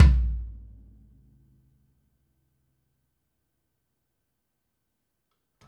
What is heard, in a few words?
Kick Shard; ZeroGravity Drum Shards